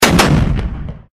Enemy Hit Special; The Powerpuff Girls - Mojo Mayhem; NextlevelGames

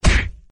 henchmen hit; Henry Danger - Day Of Danger!

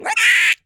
hit screech; Henry Danger - Day Of Danger!

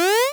jump; Auto Platformer

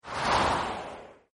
zoom; Legends of the Hidden Temple - Unlock the Past

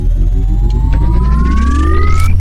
power up charge; Cartoon Network - Toon Cup 2020

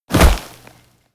hit ground; Nick Summer Sports Stars

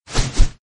whoosh; Power Rangers vs Teenage Mutant Ninja Turtles - Ultimate Hero Clash 2

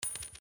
Coin; The Adventures of Puss In Boots - Catch The Thief; NextlevelGames

